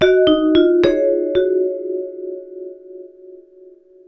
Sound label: Vibes; miniBAE source code audio